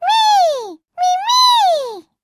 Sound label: water